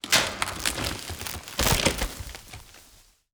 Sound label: lumber tree expire